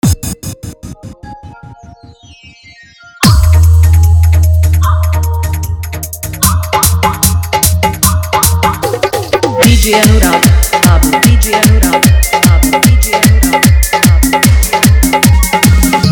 Full Dhollki Bass Rod Dance Mix

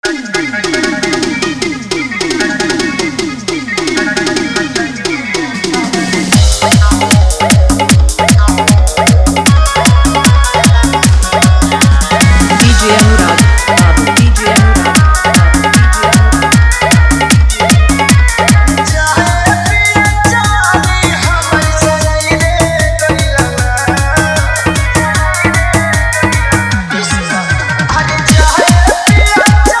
Bhojpuri Hot Full Dhollki Bass Dance Mix

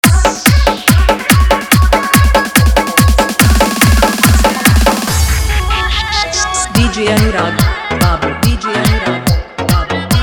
Bass Mix

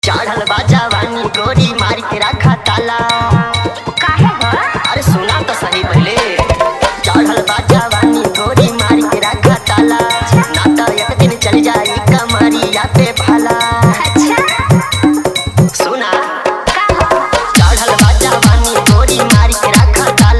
Bhojpuri Hard Dhollk Bass; New Bhojpuri

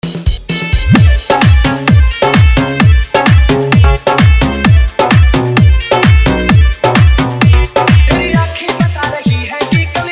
Full Electronic Basss Club Dance Remix

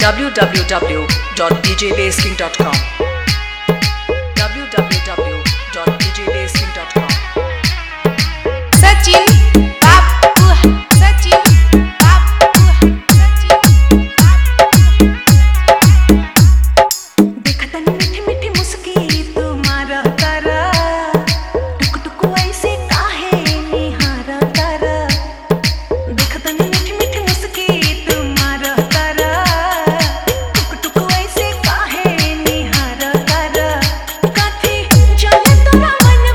Hard Vibration Mixx

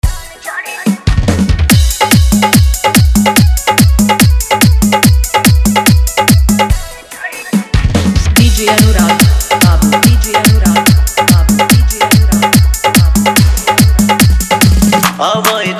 Bhojpuri Hard Dhollki Bass Mix; New Bhojpuri

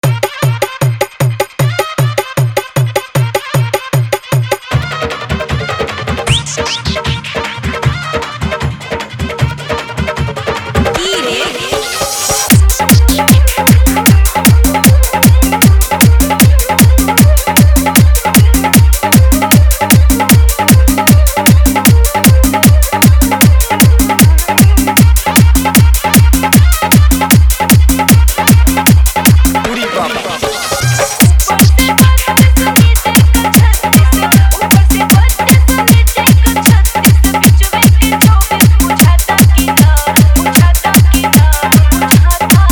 Full Dhollki Bass Rod Show Garda Dance Mix

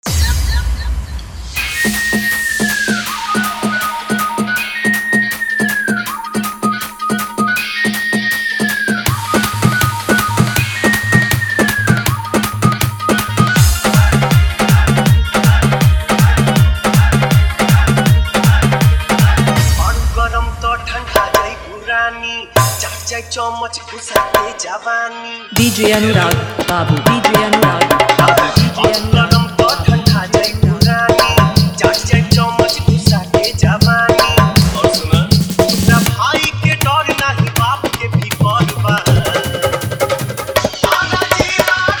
Dholllki Bass Rod Dannceing Mixx

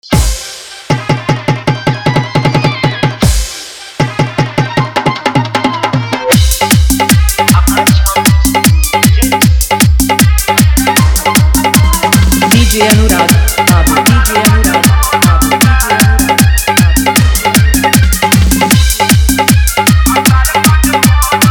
Full Dhollki Bass Dance Mix

